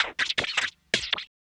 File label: LOOP L, Drum & Bass 160 BPM